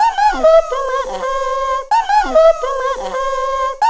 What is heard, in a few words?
PYGMY LOOP, Ethnic; Zero G - Ethnic